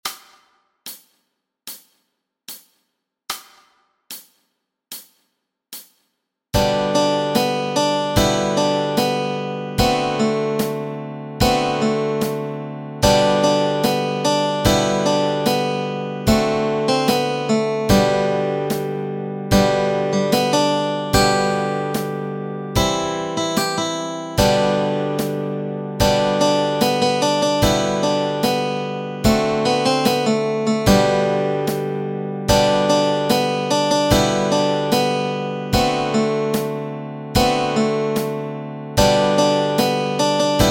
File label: Camptown Races Melody and Chords Track